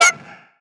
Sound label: Synth